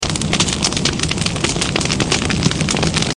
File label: tank on fire